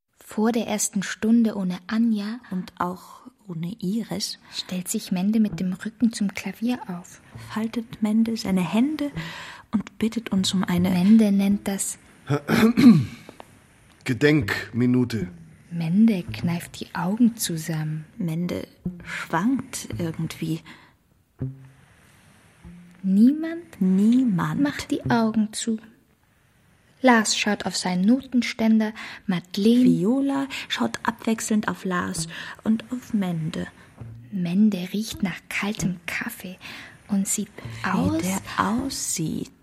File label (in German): Unter Wasser